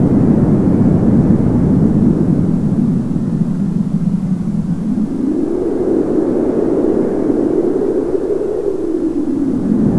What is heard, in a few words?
wind; XDM; ambience